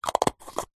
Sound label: food vegemite plastic jar lid on